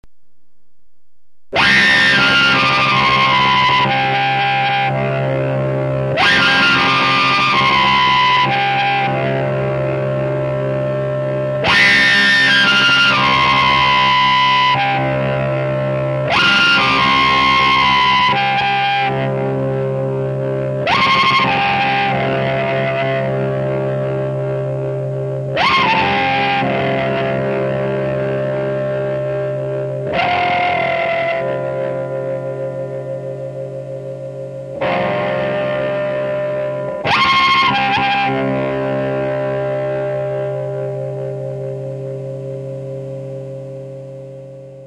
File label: Tube Zipper